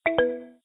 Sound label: received transfer; cstrike